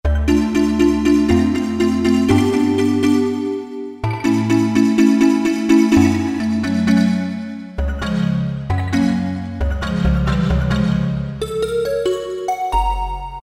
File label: Bellz, Yamaha TG33; Factory Presets; Preset bank 1